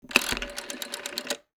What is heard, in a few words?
rotary phone